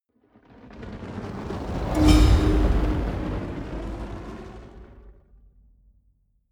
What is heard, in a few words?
whoosh magic fire bowl